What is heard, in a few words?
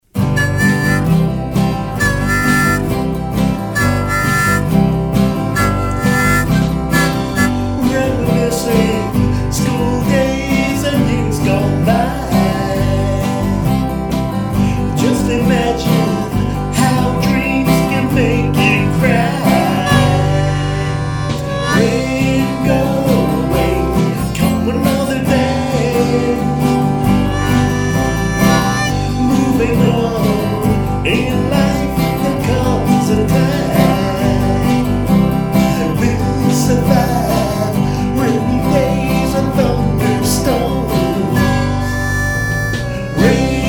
rain go away, harmonica guit